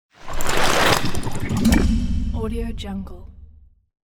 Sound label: liquid metal transition; futuristic sounds